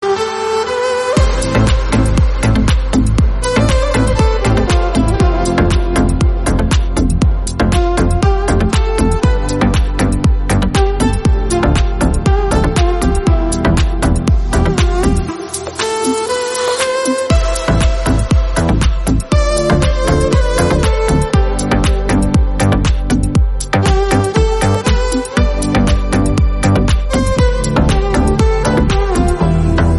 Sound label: Arabic Violin Instrumental Bgm Arabic